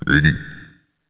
frog

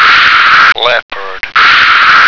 leopard

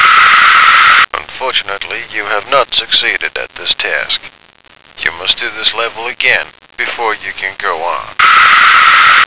Failed Level

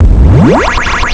GOOP

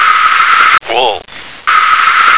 wolf

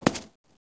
WOOD

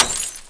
GLASS

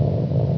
push

